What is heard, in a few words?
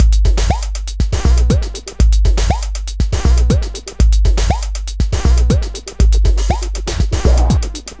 Beat, Drums & Percussion; Electrocution; Beats